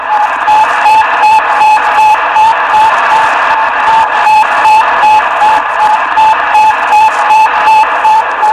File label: sputnik 1